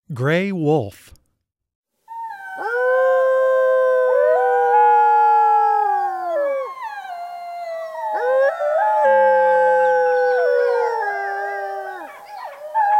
Grey Wolf, Wildlife Sounds

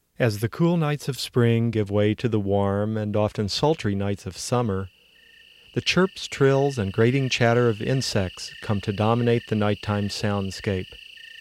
Insects, Night Sounds; Animals; A Guide to Night Sounds

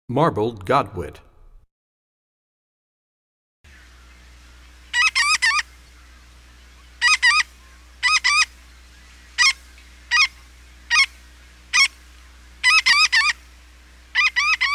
Marbled Godwit, Bird Songs; Animals; 101BirdSongs

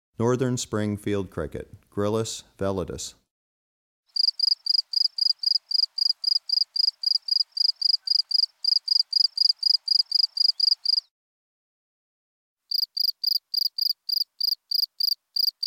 Northern Spring Field Cricket, Gryllus veletis; Crickets and Katydids

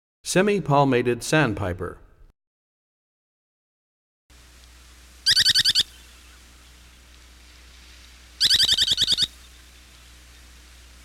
Semipalmated Sandpiper; Animals; Birds; 101BirdSongs

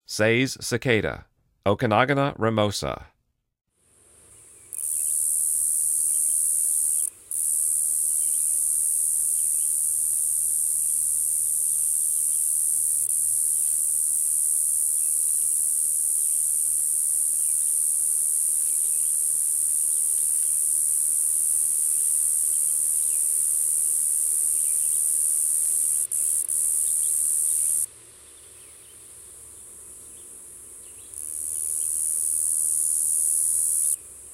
Say's Cicada, Insects; The Songs of Insects

